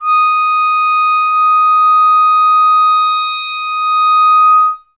clarinet, D#5; Best Service ProSamples vol.51; Classic Orchestra 2

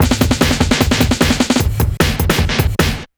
drums, Hardcore Breakbeat, 151; Big Fish Audio; Hardcore Breakbeat Action; Rhythms 4 Life 151 Ebmin

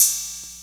CR-8000 KIT; Rubber Chicken Software Sample Bank; DRUMS + PERC

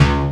TICTALK DRUM

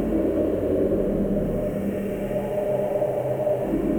Sample n Hold Verb Loops, sparse soundscape

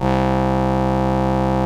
LAMBDA, String Machines

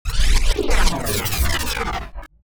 Bass Weapon Power Down, Sci-Fi Weapons; David Dumais Audio - Sci-Fi Weapons Pack 1

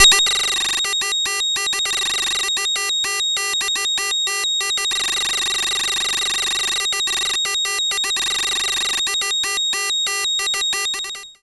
FX SWEEP, SYNTHSWEEPS

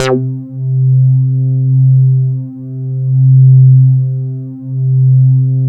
SYNTH3 C1; Analogon

